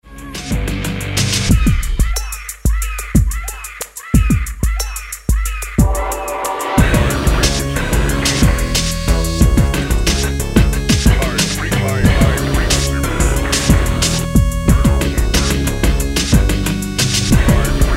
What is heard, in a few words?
distort